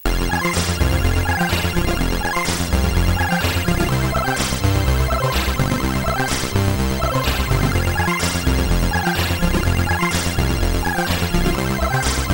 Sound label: c64 real hw; c64ii; red max